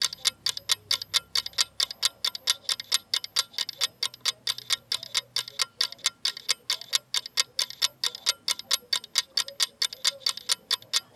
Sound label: Lux Kitchen Timer